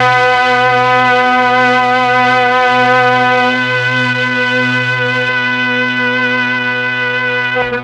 MEL STR, Legendary M400; ILIO; Propeller Island

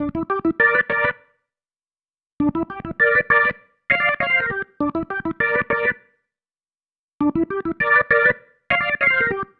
Pattern, Organ

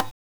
Bongo, Genesis; The Lion King; M8

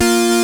Basssta, Novation Bass; Giga Samples Collection

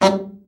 BARI, Baritone sax; Best Service; Killer Horns

